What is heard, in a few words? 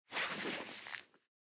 Duffle Bag Take Off Shoulder; Rustling; Objects; Blastwave; Foley